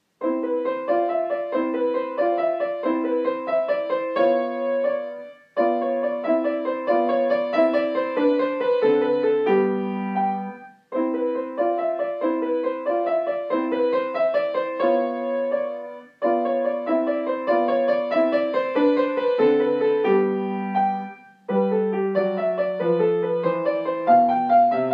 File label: piano